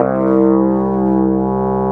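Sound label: Wurlitzer C2, Keyboards Old School; USB Soundscan vol.09; AKAI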